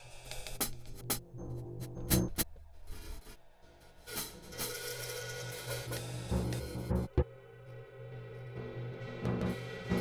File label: Cymbal Spits; Ambient Cinematic